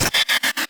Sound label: notifications; RED Hydrogen One